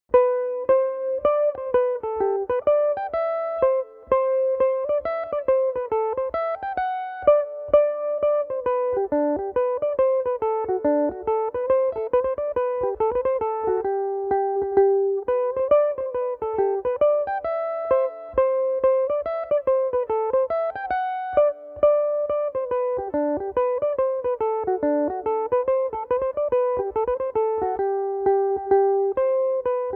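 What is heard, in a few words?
hornpipe G major, Irish mandolin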